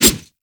Wolf Swipe; Animals; Duviri

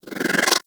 Speedball Catch Vortex Activate; Warframe; Missions; Tenno Ball

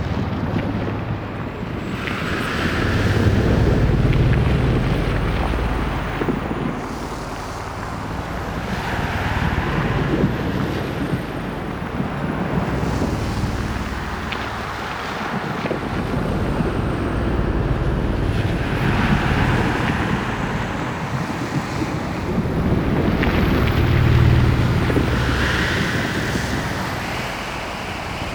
Moon Exterior Ambience; Warframe; Orokin Moon

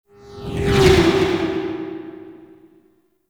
Motorcycle Friendly Exit; Warframe; Cinematics; Mall Intro

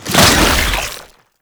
Underground Attack Tentacle Emerge; Warframe; Abilities

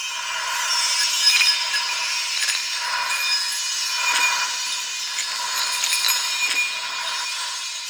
Gas Pickup Loop; Gameplay; Warframe; Nineteeen Ninety Nine; PvPvE

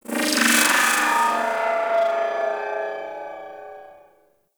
Corpus Ship Robotic Random Calls; Warframe; Corpus Ship Remaster

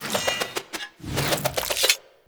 Infested Boomerang Idle Spin; Weapons

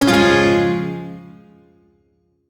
Shawzin Phrygian Chord; B; Warframe; Duviri

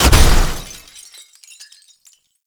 Vial Hazard Explosion No Liquid; Warframe; Entrati; Hazards

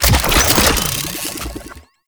Water Sprayer Reload; Warframe; Weapons

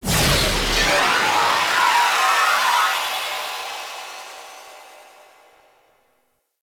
Reactor Power Absorb; Ambience; Props; Corpus Ship

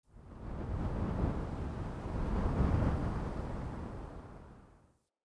Fort Wind Gust Soft Buffet Airy; Ambience

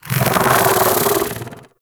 InfKDriveBug Vocal Alerted; Warframe; Hunting Critters

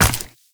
Run Ice, footsteps; Warframe; Tenno

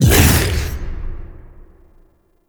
Bowl Jump Second A; Warframe; Grendel

